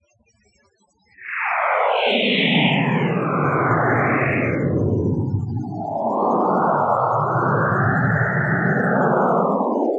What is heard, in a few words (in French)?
echographie